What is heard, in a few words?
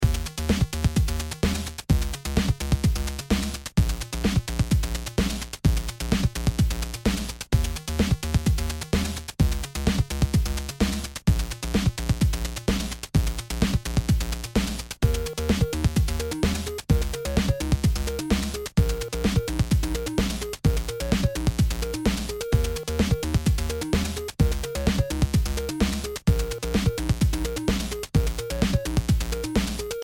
beat 50